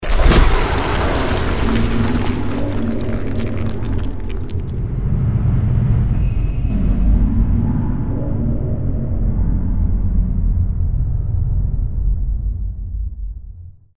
mausoleum; undying

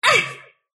sylph pain; dota; dark willow

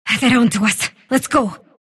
sylph move pain; dota; dark willow

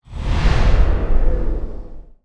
arcane bolt target; weapons; dota; skywrath

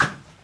preattack; dota; marci

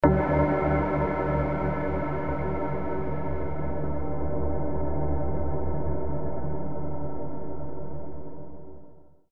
scan; ui

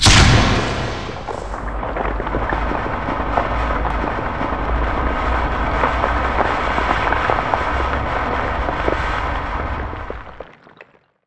shrapnel shatter, sniper; weapons

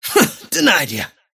deny pain; dota; kez

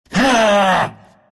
drag anger; dota

